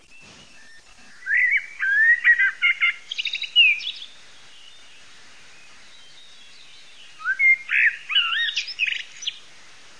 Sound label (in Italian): MERLO noise, birdsound